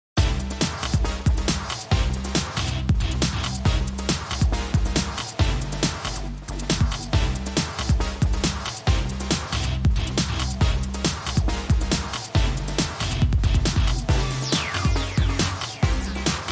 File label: Inst